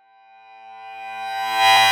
Reverse Fx; musicradar

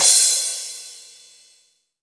CYM, Dance Drums; Best Service ProSamples vol.15; AKAI

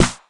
clap low boxy, Drum Hits; Classic Chicago House; 90 s Sample CDs

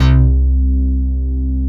PPG BASS

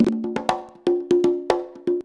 CONGA 119, Modern Percussion Loops

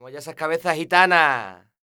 Flamenco, Vocals, Male

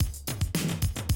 110, Classic Drum Loops

